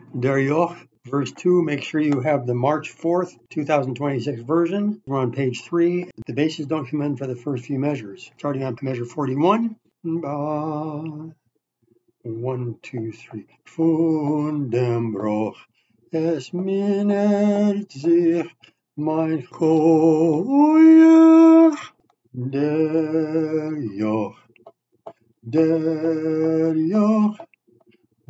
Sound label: Bass Verse; Verse2; Der Yokh